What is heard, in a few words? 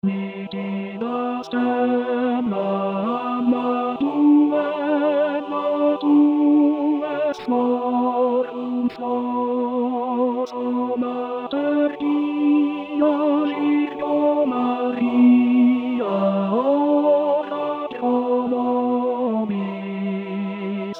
Nitida stella tenori